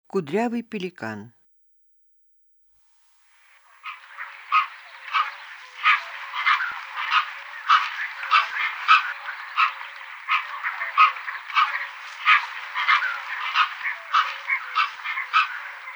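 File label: Dalmatian pelican voice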